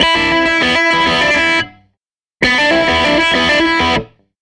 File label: SG Guitar Loop